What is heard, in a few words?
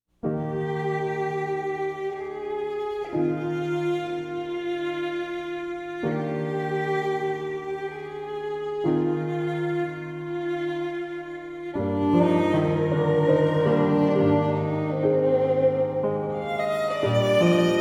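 meditation, organ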